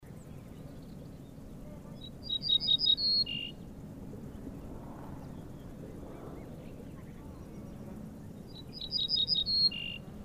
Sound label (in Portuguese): Sombria